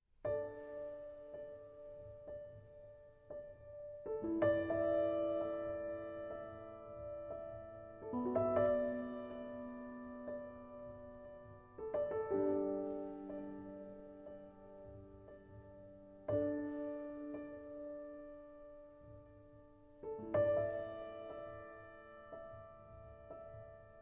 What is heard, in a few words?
Solo Piano Version